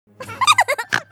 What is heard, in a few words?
giggle; pinkie pie; mlp